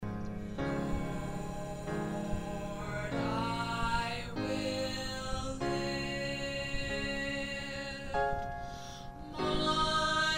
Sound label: Alto